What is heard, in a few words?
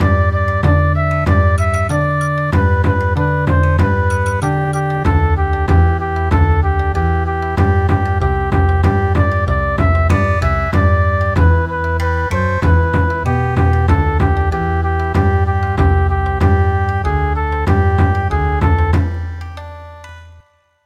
Bon Odori; Long I gaze across the snow